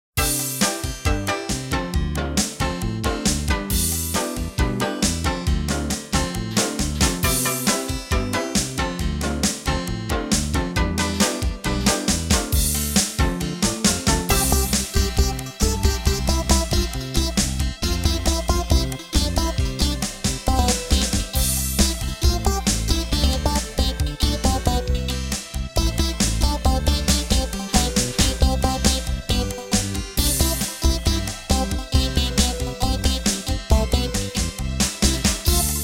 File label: instrumental